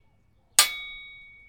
Metal Colliding

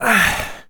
Male Vocal Breathy Aahhh

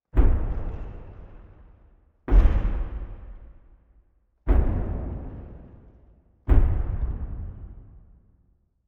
Dino Stomp

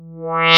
Short Tractor Beam

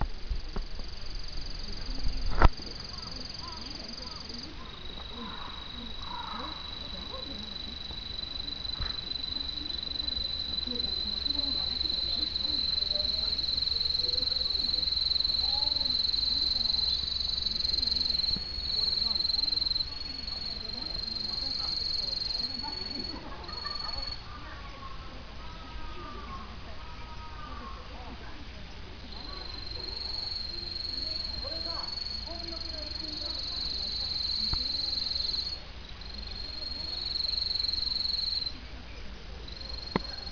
Insects kamakura japan

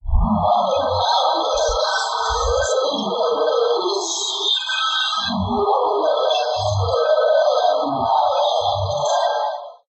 Digighost

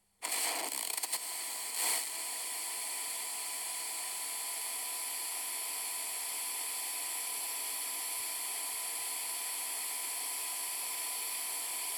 Input Distortion

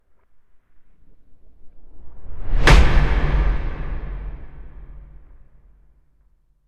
Strong Cinematic Hit

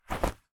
Jacket Cloth Rustle

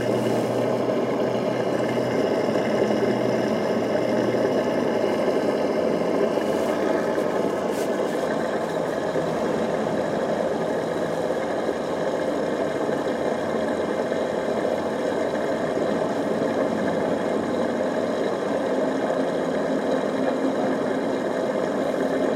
Gasoline Nozzle

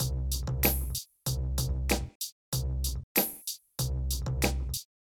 Hiphop Loop

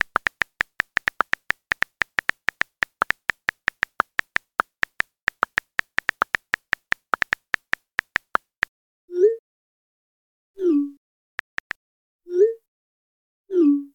Text Type Send Receive Iphone

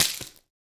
Plastic Bag Explosion

